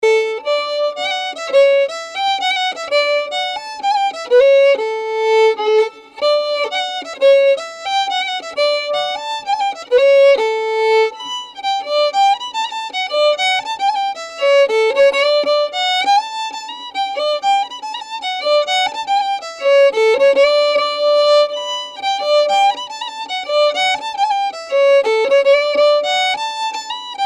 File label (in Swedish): polska dur; Jann Mor polska